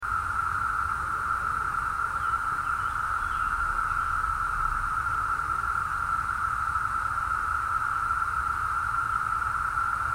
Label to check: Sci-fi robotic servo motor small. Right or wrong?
Wrong